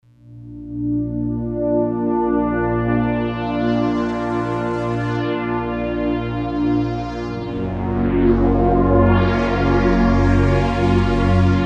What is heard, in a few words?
Slow Moog; Digital Synthesizer